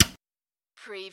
Servo Panel Sound - Switch TV

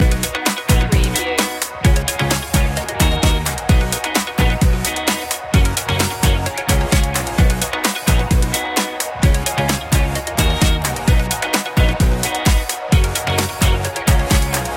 happy feel loop

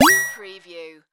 Digital Signal Wa Pling

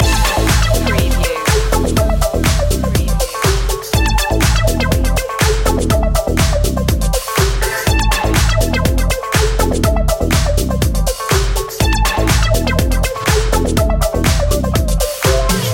back in fashion loop

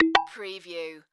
Multimedia Percussion

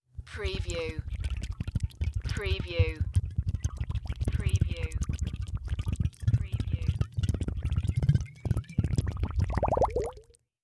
Wine Bottle Submerge; Sound Effects Bible

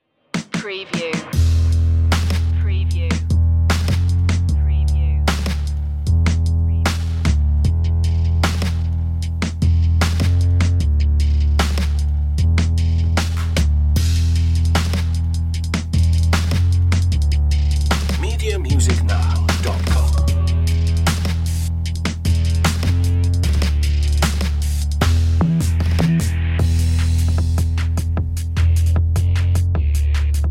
urban jungle drums and bass

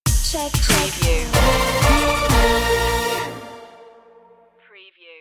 shake shake stinger

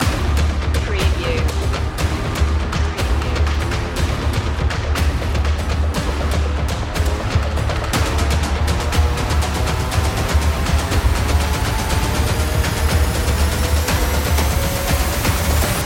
attack force loop

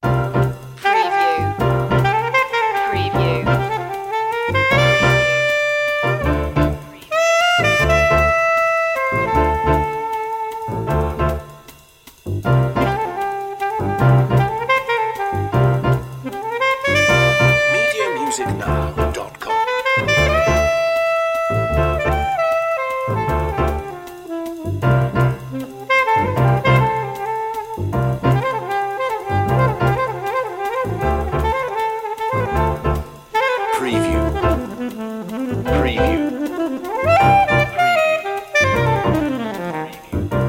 crazy sax

